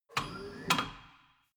tire changing machine turning platform latch short